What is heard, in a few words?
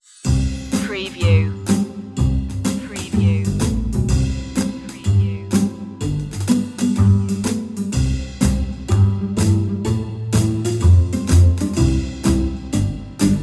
toy soldier underscore